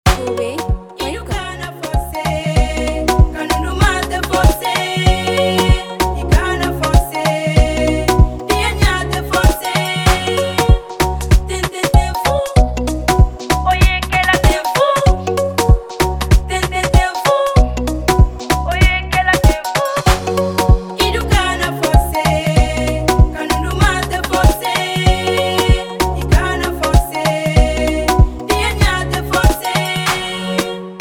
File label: instrumental